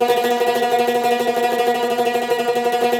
CUMBUS Tremolo

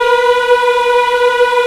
VOX African; Roland LCDP11 Africa VOL-1

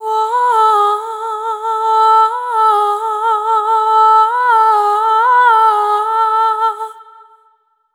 Servitude, vocal samples; Sonic Foundry (Sony Creative Software) - Pocket Diva World Class Vocal Samples; Op-Erratic Loops

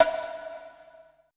CONGA R; BPC DRUMS

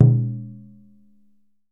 DJUN DJUN, African Drum; E-MU Producer Series Vol. 7 – Old World Instruments

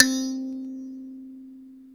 thumb piano; Zero-G Ambient 1

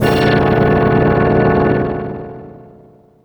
CHORD, 145 BPM; Ueberschall; Drum & Bass Resonance

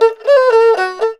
ERHU LICKS

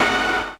BRASS HIT; Crypton - DrumBase5000; RZ1

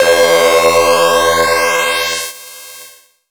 Synths Note C

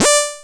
Anasound Short hi D; Sonic Foundry (Sony Creative Software)

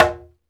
Congas, African Contemporary

